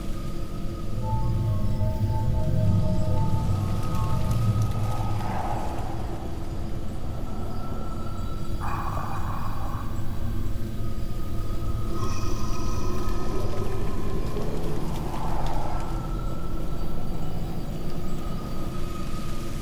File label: Amb Boss; bloom minigame